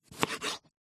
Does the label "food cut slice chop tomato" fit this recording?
Yes